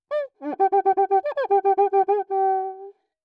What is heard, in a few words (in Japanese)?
擦奏 クイーカ; 膜鳴楽器; cuica